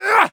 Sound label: warrior attack swing